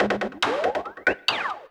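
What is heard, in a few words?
PERCUSSION ELECTRO 140, Techno Drum; Techno2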